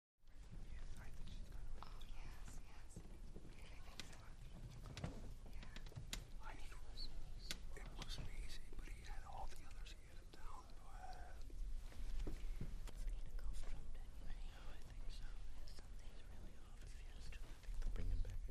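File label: Crowd 12 Presence Light, small interior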